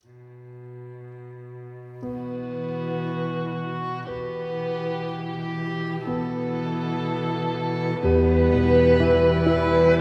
Dramatic Intimate

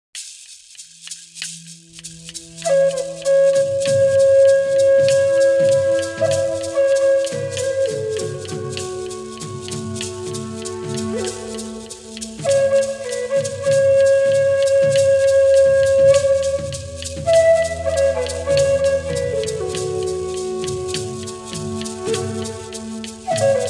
Meditation Site of Mystery, Relaxing Rhythms

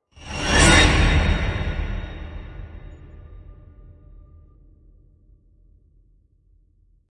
Destroy Whoosh; Gothic Storm Music; Brain Warp

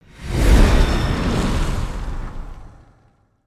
Hit Roof Cave In; Brand X Music; Brand X Music Catalogue - Editor's Essentials

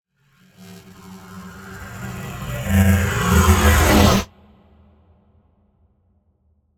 Storms Meteor; Gothic Storm Music; Advanced Sound Design

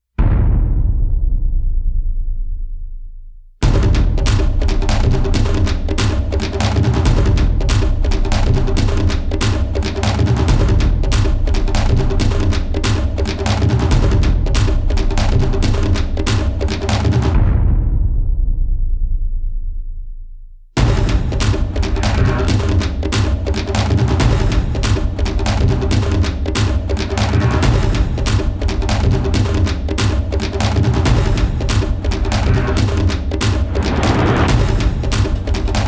Percussion; Fired Earth Music

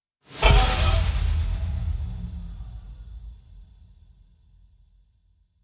Impacts Abstract Explosion; Gothic Storm Music; Advanced Sound Design

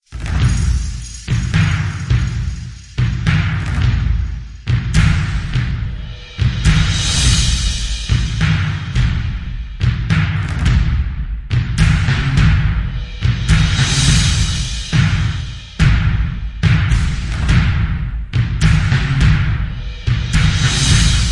drums only version

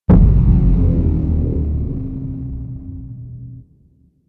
Omnipotent Hit Downer; Ninja Tracks

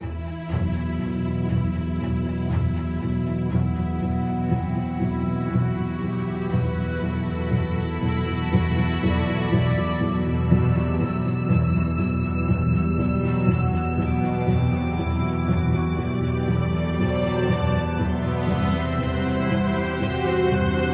Jealous Ambient

